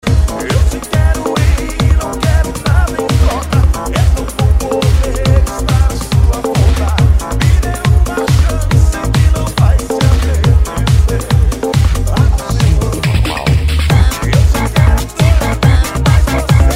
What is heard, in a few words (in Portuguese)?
sertanejo remix e electro dance